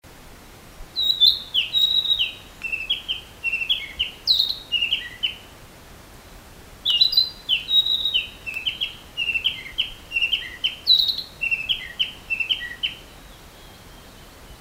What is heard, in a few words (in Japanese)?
kibitaki